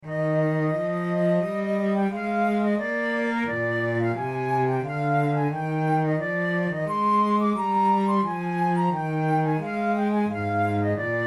adagio, flute

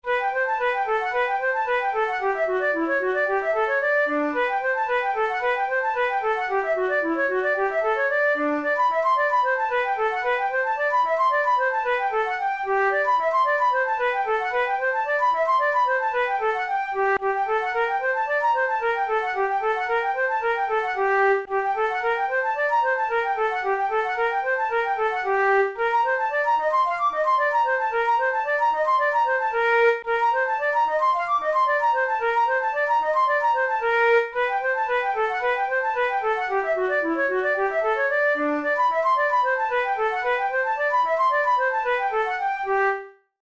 scherzo, flute; braun; pieces sans basse no7

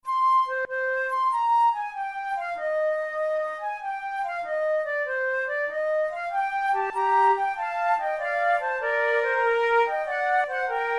flute sonata allegro; mattheson

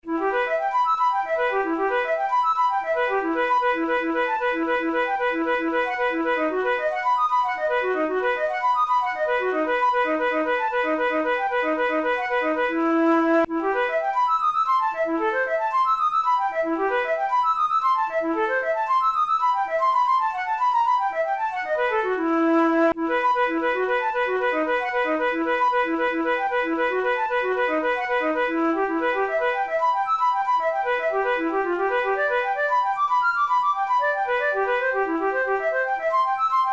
methode pour la flute study; drouet

